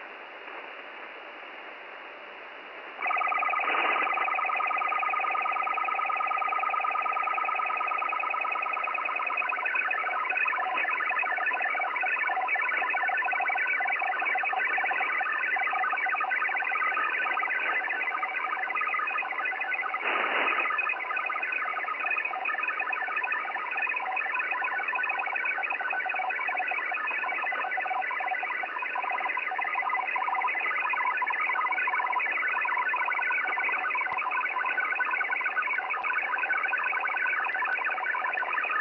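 Chinese 8 FSK 83.55 Bd, CHINESE MILITARY ROBUST 83.55 Bd 8-FSK; CHN-8FSK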